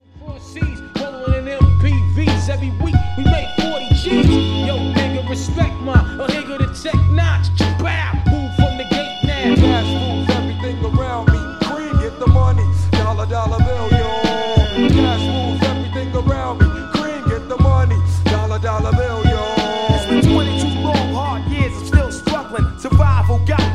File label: hiphop